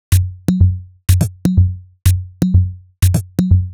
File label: Top F# 124bpm, House Drumloops; Zero-G House Fabrik Drumloops